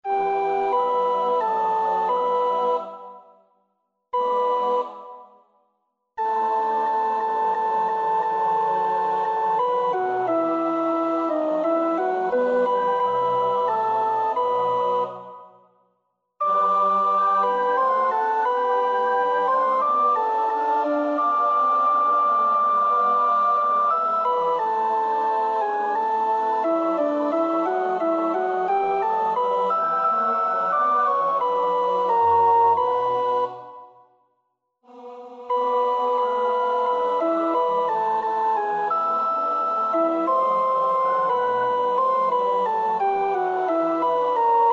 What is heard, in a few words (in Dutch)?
sopraan, koorpartij; Bach (Johann Sebastiaan); So nun der Geist